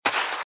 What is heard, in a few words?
Footsteps - Sand - Dry - Run - Right